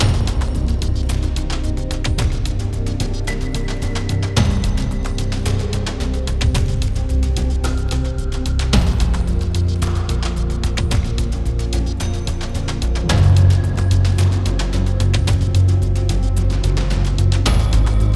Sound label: Dungeon World Idle LOOP